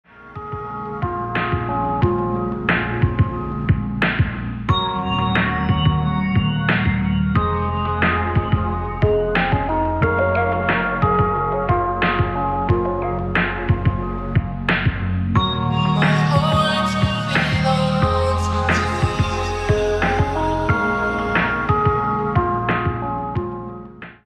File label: ambient chill